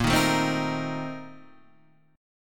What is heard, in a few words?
Bb Major 7th Suspended 2nd Suspended 4th, Guitar, Open D